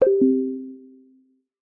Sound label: UI New Mail; Mailspring